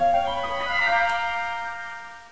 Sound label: Ring Out; TOS